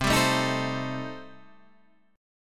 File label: C Minor 6th, chord